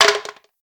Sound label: Hit; moul